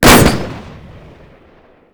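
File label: grenade rifle; WAR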